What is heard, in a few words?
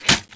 end switch; ragemap2023